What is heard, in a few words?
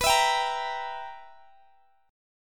B Major 7th Suspended 4th Sharp 5th B down Guitar Standard; BM7sus4#5; strummed; capo=9